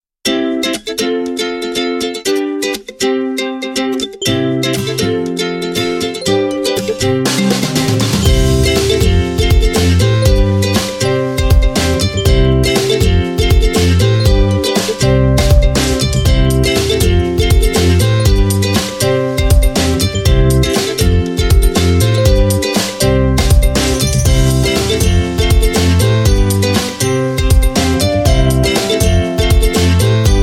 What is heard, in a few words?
Jingle